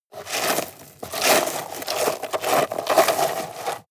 shovel dig

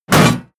hit armor metal

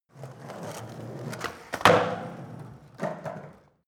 skateboard indoors drive kick away

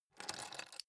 key ring large grab table soft

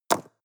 stone drop clack

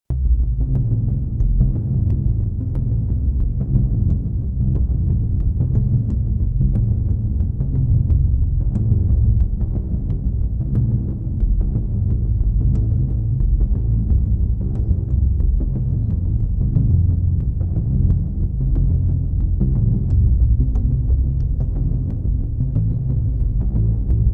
sequence bass in a big room

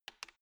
embedded button press quick

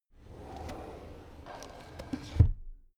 drawer cabinet crammed close soft

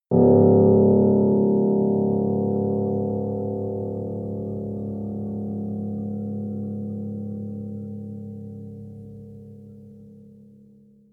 piano deep

